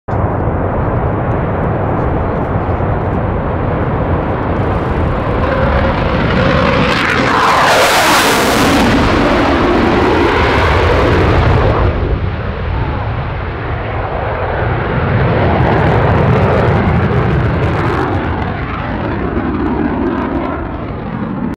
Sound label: Rafale; جنگنده; رافال